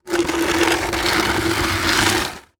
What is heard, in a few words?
Impact Plastic Bucket Dragged On Concrete